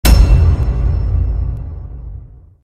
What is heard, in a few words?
Cinematic Hit